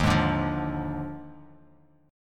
D sharp Major 7th Suspended 2nd Suspended 4th, down, chord; strummed; JGuitar